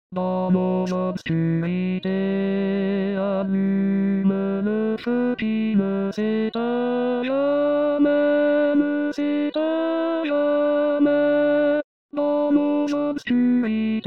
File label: SATB Tenor